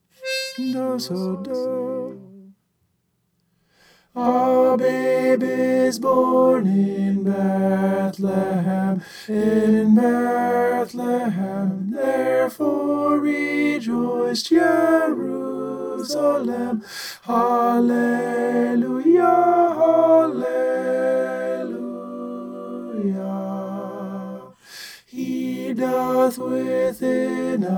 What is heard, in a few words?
tenor